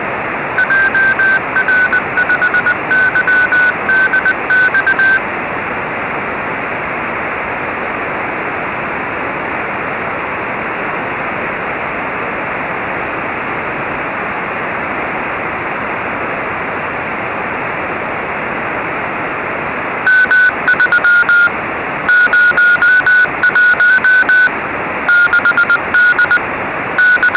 stars2d cw

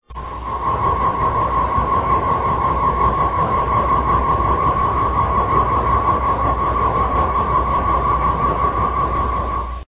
echo balloon

